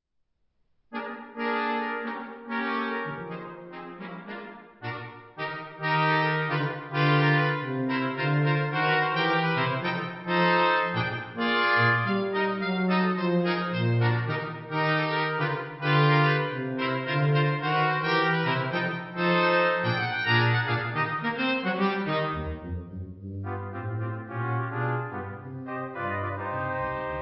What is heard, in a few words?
Charleston Blues Cake Walk Brass Quartet